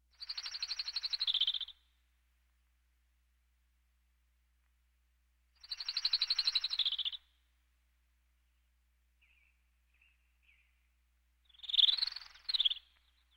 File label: Birds